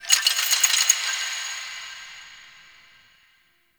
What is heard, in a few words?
Chains Small, Fortress Ambience; Warframe; Grineer